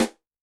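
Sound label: Piccolo dry, Yamaha DTXpress-II snare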